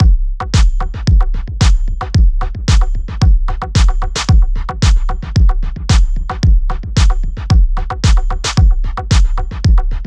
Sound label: snare